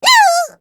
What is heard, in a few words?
shock; rik